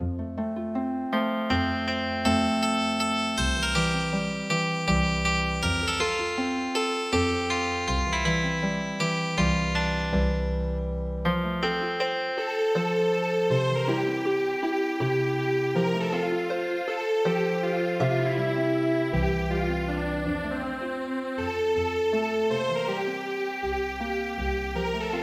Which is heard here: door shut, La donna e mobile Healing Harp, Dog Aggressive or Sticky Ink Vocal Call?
La donna e mobile Healing Harp